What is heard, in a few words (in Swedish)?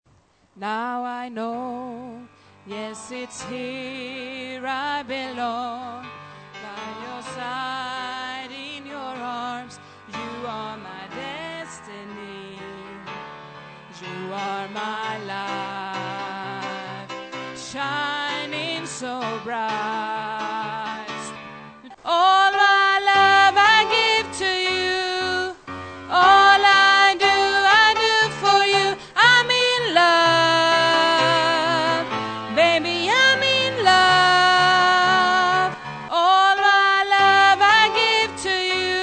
sopran